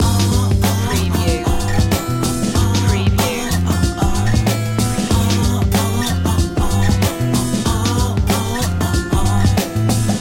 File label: beats loop